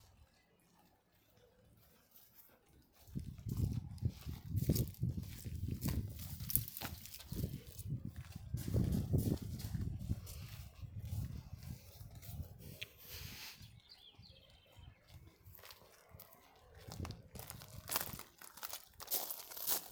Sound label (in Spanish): Pasos